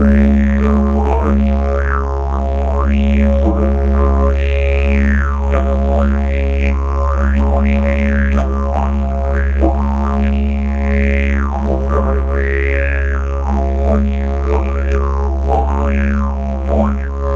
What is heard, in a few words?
melodic smooth gypsy didg, ethnic